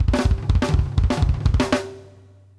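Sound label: around tom, drums; Sampleheads; New York City Drumworks VOL-1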